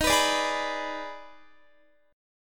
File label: Eb Major 7th Suspended 4th Sharp 5th Eb, Guitar; EbM7sus4#5; strummed